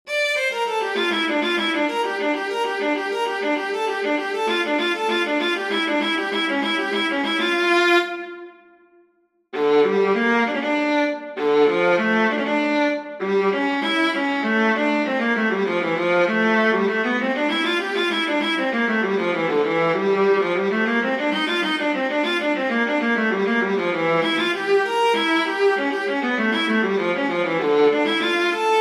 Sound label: praludium minor for viola cello; bach johann sebastian